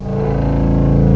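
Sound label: BOWED BASS; LOSTRING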